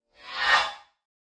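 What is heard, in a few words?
Pop Up Electric Whip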